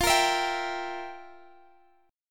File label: F Major 7th Suspended 2nd Suspended 4th, F, down, guitar; strummed